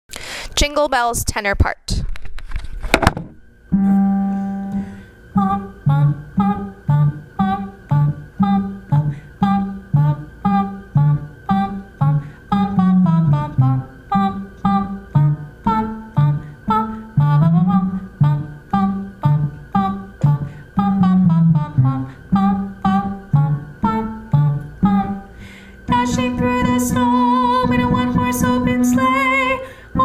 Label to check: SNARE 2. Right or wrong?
Wrong